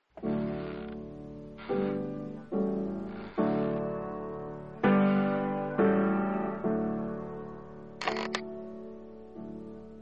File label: cuban lullaby